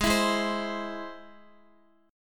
Ab Augmented Ab, Guitar, 4E, 4A, 5D, 5G, 5C, 6F; Ab+ strummed; JGuitar

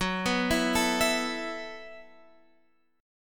A Suspended 2nd F sharp; Asus2/F#; arpeggiated

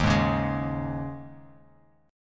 D sharp 7th Suspended 4th; D#7sus4 strummed; JGuitar

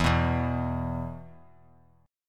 E 5th; strummed; JGuitar

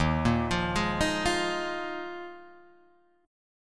Eb Suspended 2nd Suspended 4th, Guitar, Drop D

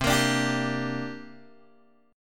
C 7th Suspended 2nd, Guitar, Open G

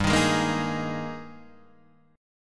G sharp Major 7th Suspended 2nd

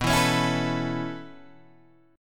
B 9th Suspended 4th B down Guitar Open G

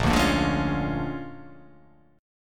A sharp 7th Sharp 9th

